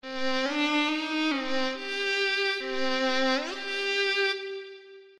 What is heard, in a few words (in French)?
Legato Violon; Realisme